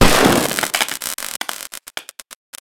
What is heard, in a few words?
fx explosion robot bzzzt